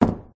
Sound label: phone drops on wooden table; your free sounds